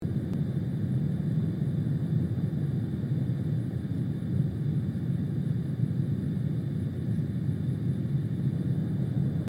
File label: Gas stove burner flame loop; cooking